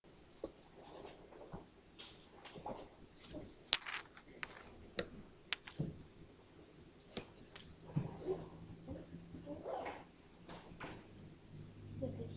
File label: camera